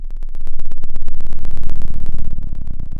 Sound of an engine revving

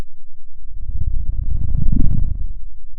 pop, Sound of a dinosaur

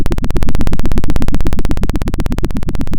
Sound of a helicopter

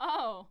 surprise moderate; VIVAE

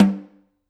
Snr Off, drum

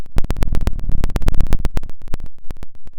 pop, Sound of a fire crackling

